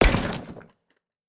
empire game settlement ui demolish destroy destruction; crumble hit